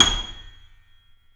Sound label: C7 FF, The Ultimate Piano Collection; East West